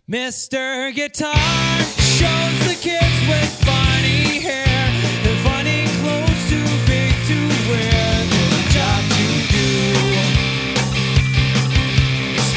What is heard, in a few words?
Guitar